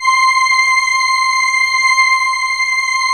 VP330

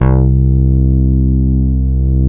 VCO bass C3; SE-1 BASSES

